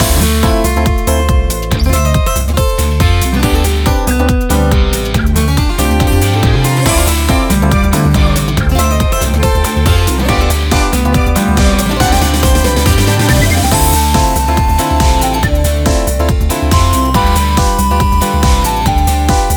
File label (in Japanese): フィールド／僻地 昼; rance x showdown windows gamerip 2018